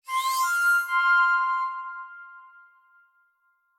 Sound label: stage complete; magic flute by mozart